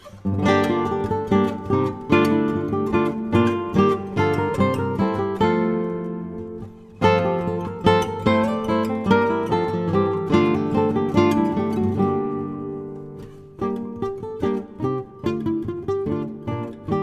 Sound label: Basse Dance; No.7 Entre du Fol; Tielman Susato